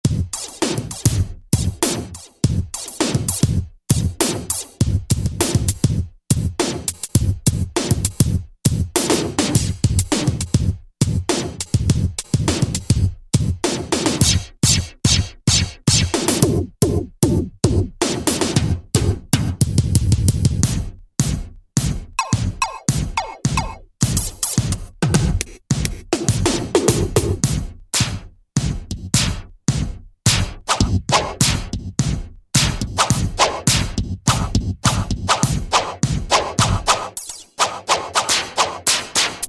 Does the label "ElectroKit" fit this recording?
Yes